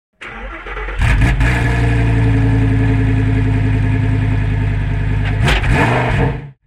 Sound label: GT3 First Gear Sound; motorsport